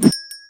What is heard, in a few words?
twig swing; tf2classic